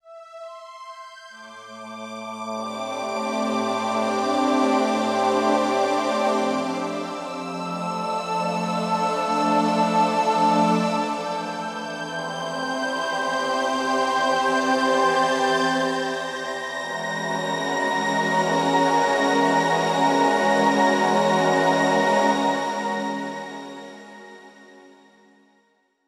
Strings and Choir, string machines; electrickeys

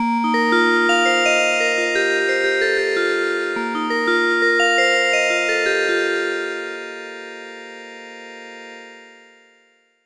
Bell; electrickeys; rare and bonus keyboards

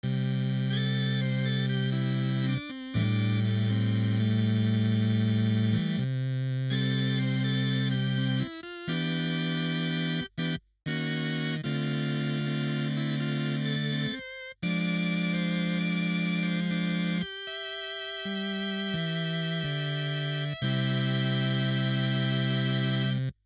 8+2 Vib, classic electric organs; electrickeys

